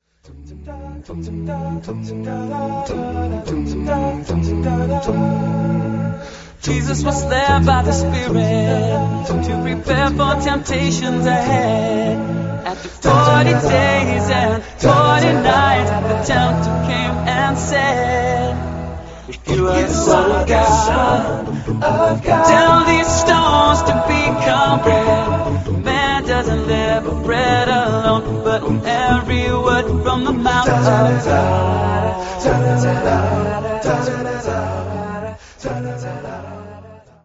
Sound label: acappella